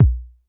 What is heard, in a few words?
kick, drums single hits; iElectribe Kicks